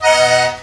手风琴 Raw Accordion; 特朗普

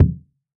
敲门 kick

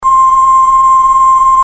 手机接听声; 音MAD; 蓝蓝路

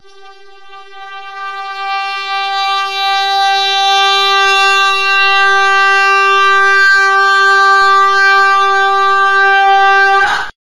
啊 G4 long; 真夏夜的淫梦

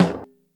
拍枪 Snare; 刘醒

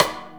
开头 Stick; 童祭

